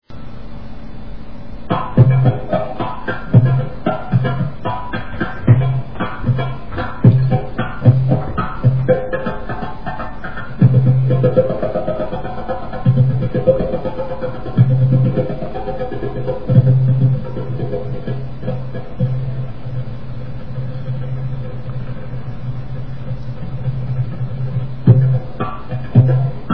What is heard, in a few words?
derboukka; Etnologia